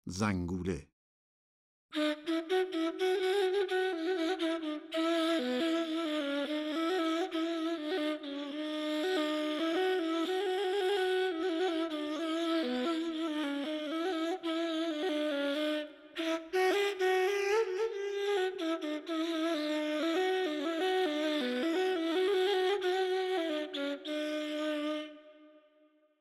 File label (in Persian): Zanguleh Segah Radif-e Ney, Dastgah Segah